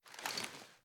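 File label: sniper raise first raise; viper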